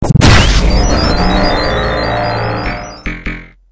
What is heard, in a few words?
ar2 hit